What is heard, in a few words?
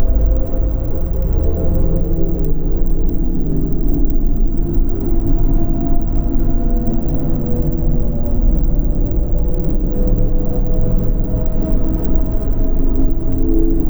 drone; Droning Sound Effects